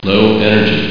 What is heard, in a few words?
lo energy